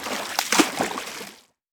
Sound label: sea water splash